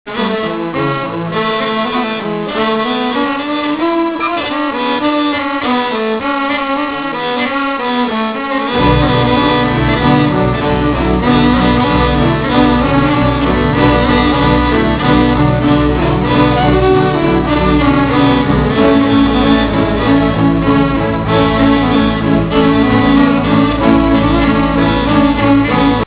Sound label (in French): violon